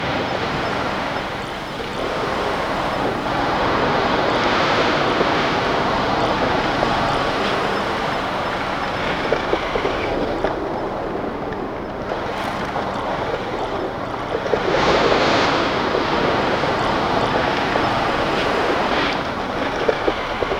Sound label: massive waves crashing